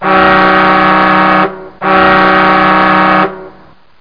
horn; Professor Multimedia

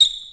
Squeek; CD Action; nbajam

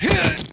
FALL

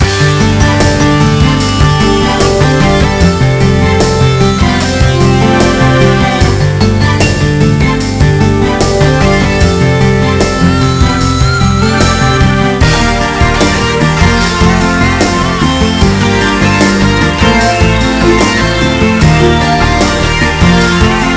Pipe Music; MacPipes

